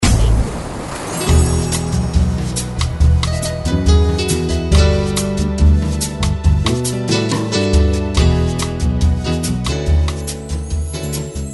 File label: Sand Breeze